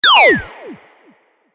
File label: Robot Enlarging Laser Beam, sci-fi robot sound effect; Andrew Fleming